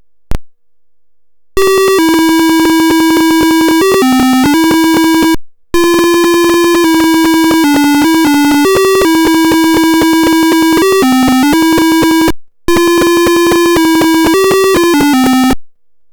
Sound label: Jingle bells, arduino